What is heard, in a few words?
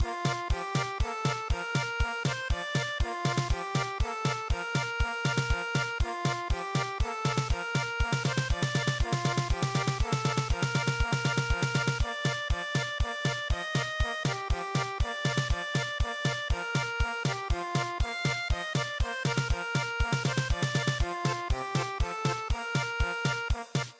German Polka, traditional; Robin Adair